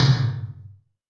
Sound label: Tom Lo Phase, Alesis D4 drum machine